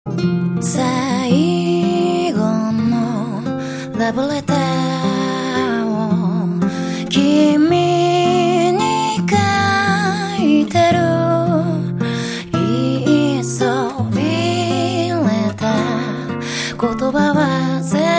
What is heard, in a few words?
guiter